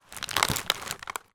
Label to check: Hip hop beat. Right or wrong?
Wrong